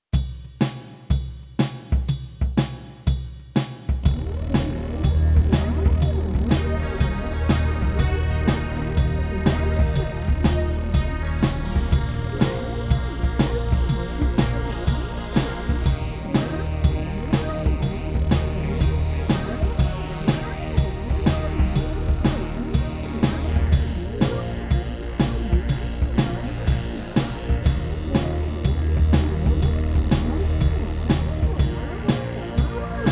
Yamaha Korgs MiniNova

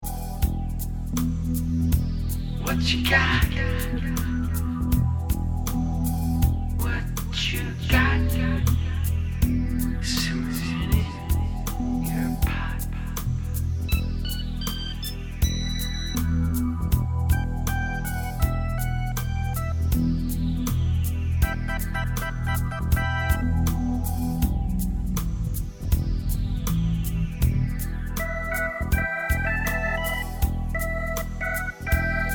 Soundcheck

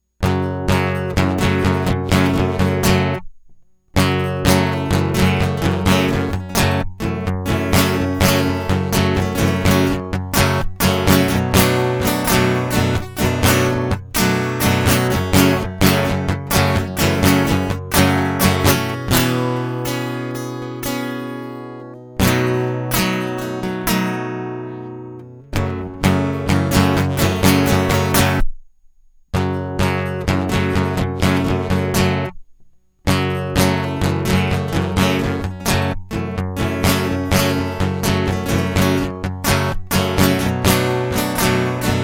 Unique Freak Guitar Loop; hyper terrestrial

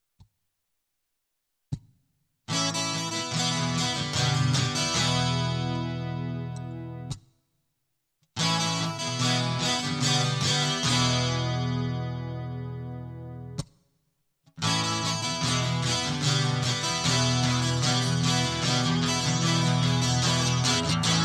Guitar Loop

